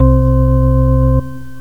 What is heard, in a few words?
ORGAN; organ 1